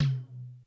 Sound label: Med Tom, Korg DSS-1 Drums